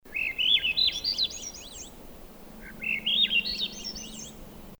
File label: Swainsons thrush